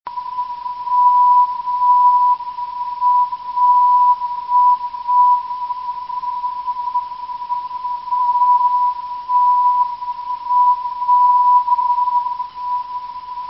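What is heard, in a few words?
330 kHz, non-directional beacon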